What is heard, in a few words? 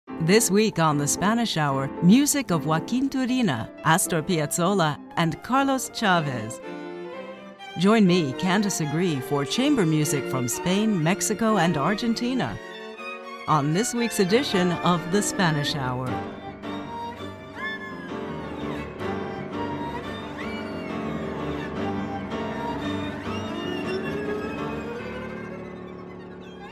20th century chamber music from spain and latin america